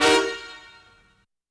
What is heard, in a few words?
Stab Tense Horn